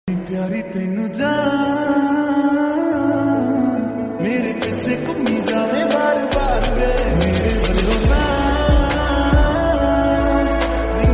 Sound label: lofi punjabi love